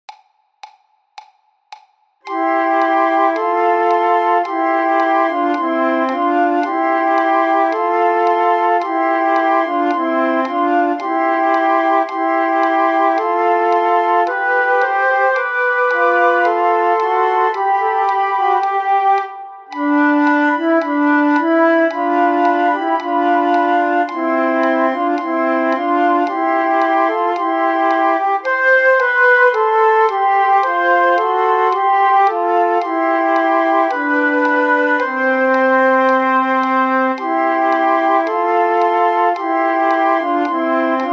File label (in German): PLAYALONG 3 stimmig